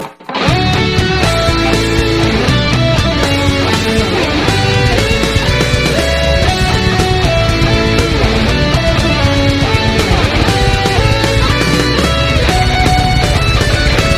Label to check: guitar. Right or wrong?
Right